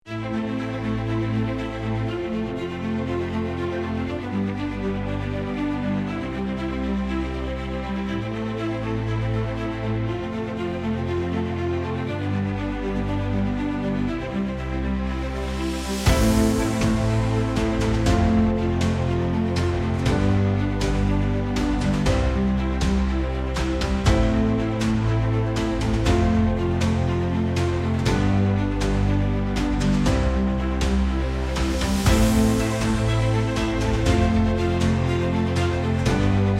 unbreakable strings, epic

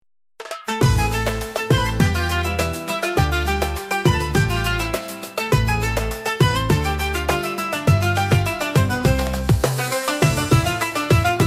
desert echoes, arabskaya

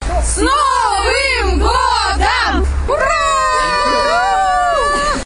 happy new year hooray

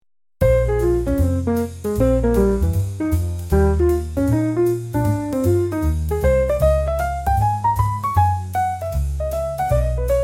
serenade under stars, jazz

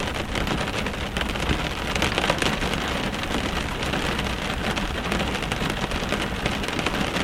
rain close soft gentle active

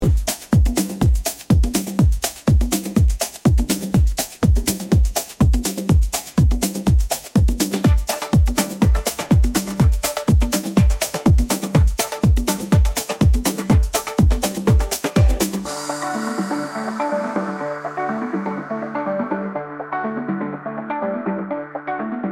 rhythm of the savannah, afrikanskaya

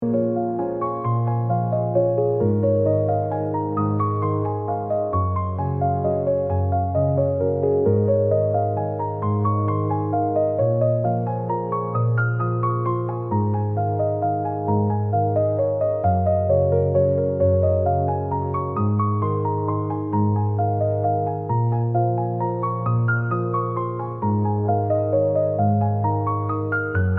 celestial whispers; bezmyatezhnaya

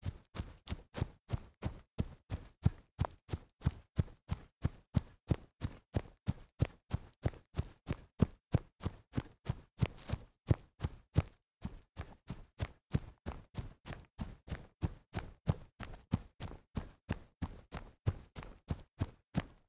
man running on the grass

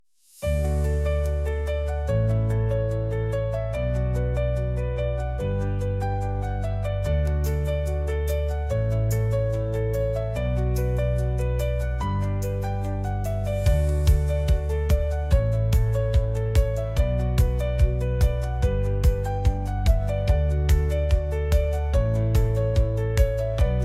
curious adventure, detskaya muzyika

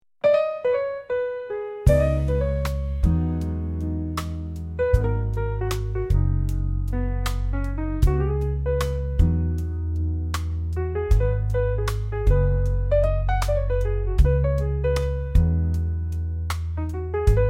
saxophone reverie; jazz

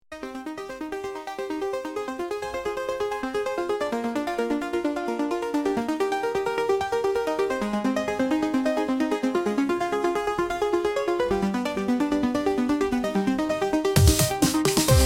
midnight inferno, agressivnaya

